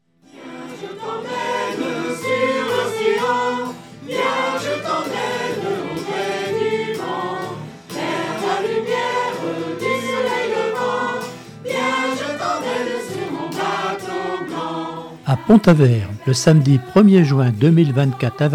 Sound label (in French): choral; PONTAVERT; Axo choeur